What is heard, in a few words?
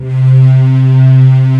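D70 warm strings; East West; Global Sounds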